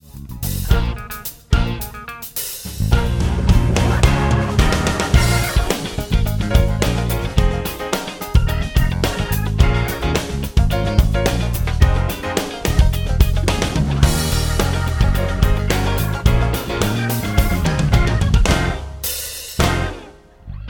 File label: Accompaniment